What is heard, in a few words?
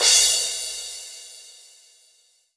Korg N1R Crash Cymbal